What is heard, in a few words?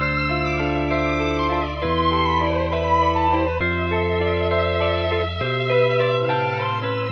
strings and guitar, Sad; loops